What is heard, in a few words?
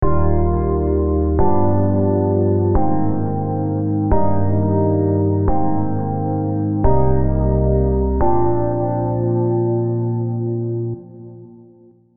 Major Prog, MIDI Chord Progressions; Unison Essential Advanced MIDI Chord Progressions